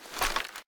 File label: imp down; deepimpact